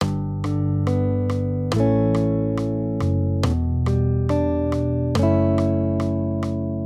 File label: maj triads